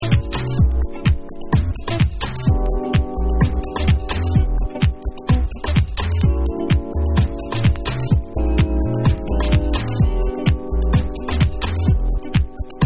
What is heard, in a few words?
Soul Instrumental